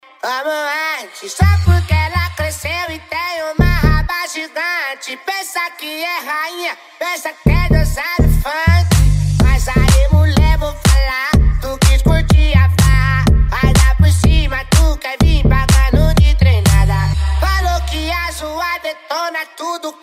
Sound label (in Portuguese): sound car mala aberta e mega funk